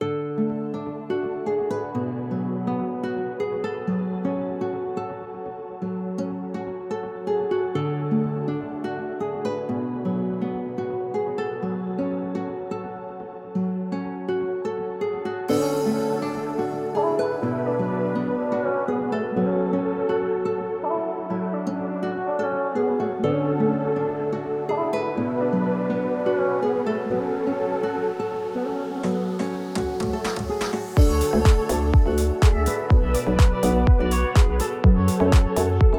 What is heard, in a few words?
Instrumental Beat